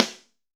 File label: SN, Snare; AKAI S6000 CD-ROM - Volume 4